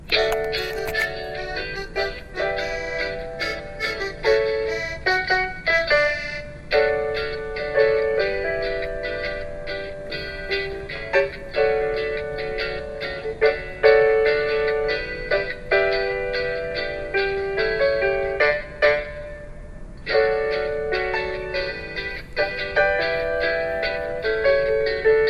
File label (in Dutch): karaoke versie